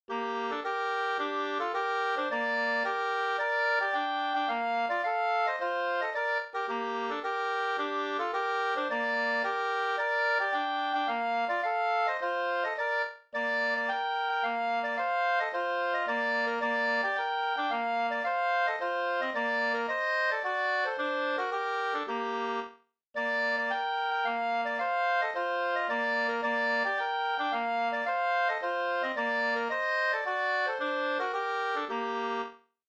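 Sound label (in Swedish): polska, tvåstämmig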